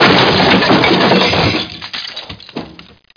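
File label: debris break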